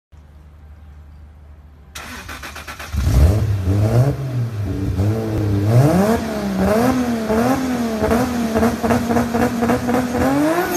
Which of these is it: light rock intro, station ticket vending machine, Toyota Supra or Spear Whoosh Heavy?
Toyota Supra